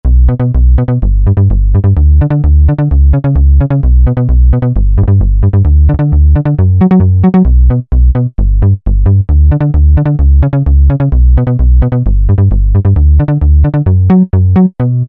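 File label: Woofer Bass, Roland SRX-07 Ultimate Keys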